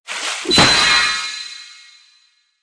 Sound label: splashes wild on the reel; koi princess